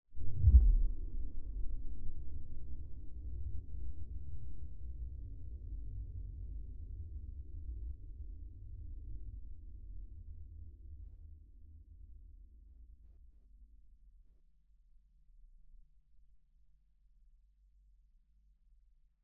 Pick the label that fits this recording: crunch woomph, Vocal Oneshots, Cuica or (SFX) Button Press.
crunch woomph